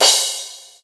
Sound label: Misc Cymbal; EMU MoPhatt